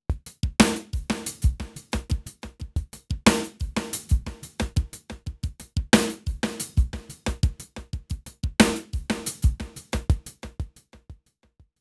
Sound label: drum loop groove 90